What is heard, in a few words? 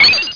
PICK; marblelous2demo